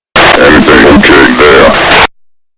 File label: human grunt